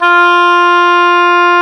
oboe, Oboe Brth; Roland LCDP04 Orchestral Winds; Oboe Brth 1

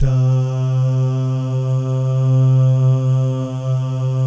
DAH, Vocal Jazz; ILIO

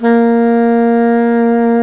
Soprano SAX mf A#3; Giga Samples Collection

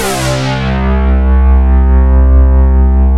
polysync; Rubber Chicken Software Sample Bank

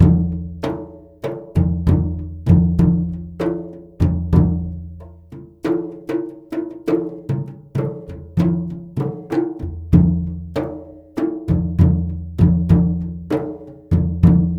MLY DRMS; Spectrasonics - Heart of Asia CD2

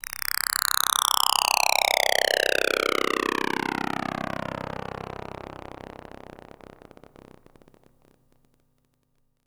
rasp down; One Shot FX; Sample Magic; Transmission-X

